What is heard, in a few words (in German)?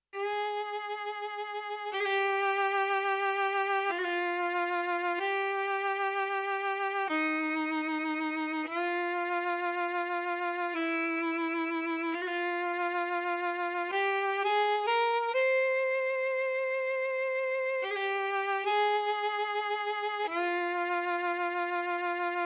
Solo Violine; CVP609